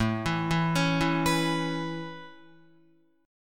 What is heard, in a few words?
A Major Add 9th A Guitar, chord; Aadd9 arpeggiated; JGuitar